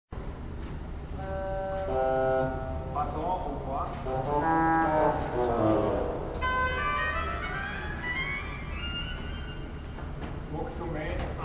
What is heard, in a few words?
hautbois vox human